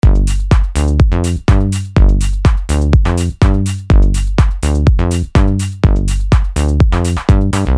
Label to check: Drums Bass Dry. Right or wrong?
Right